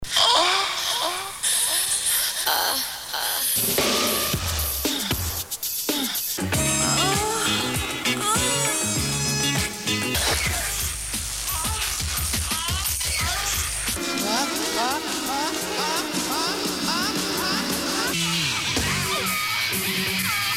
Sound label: moans